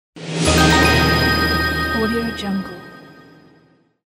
victory

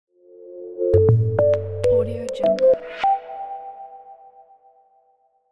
elegant ident; secondsquaremusic

